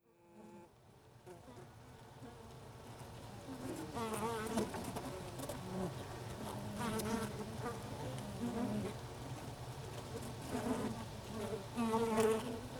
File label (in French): Ruche Interieur